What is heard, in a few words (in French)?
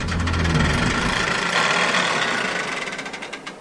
liane remonte; Arc En Ciel Et Le Lagon Merveilleux